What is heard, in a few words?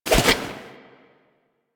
Infested Fishing Spear Charge; Warframe